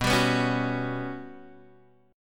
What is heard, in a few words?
G Major Sharp 11th B, chord